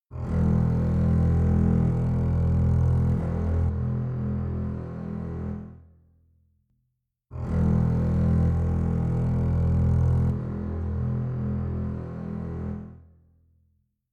pan, 2

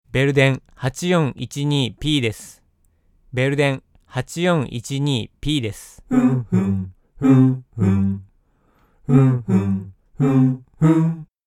BELDEN 8412P MIC

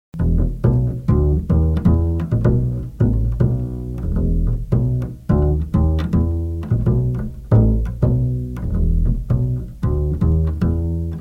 V67 BASS

